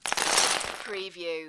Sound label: Lego, several pieces drop on floor